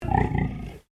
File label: Animal Pig Landrace Inside Grunt; Edgar; Edgar's Mission Farm Victoria; Pigs; Animals